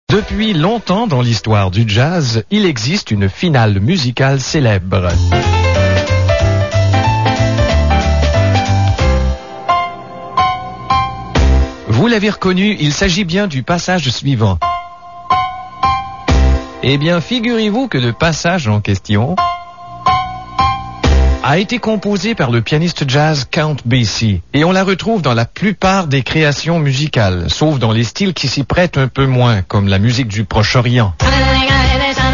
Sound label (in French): finale en musique jazz; François Pérusse